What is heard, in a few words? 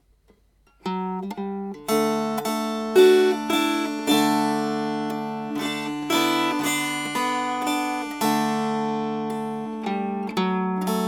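Strummed, Dulcimer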